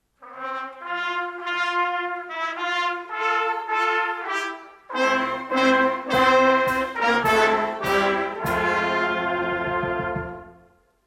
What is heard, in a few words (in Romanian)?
Fanfara; Elim Viena; Duminica dimineata